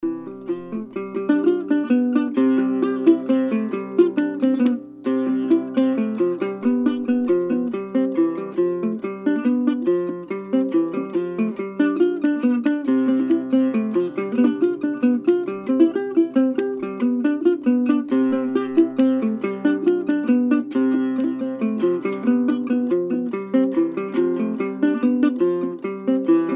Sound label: Jarabe Guit Son